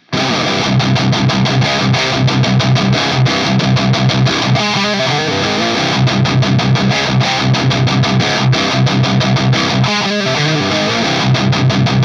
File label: Bogner 4x12 SM57 metal; Cab test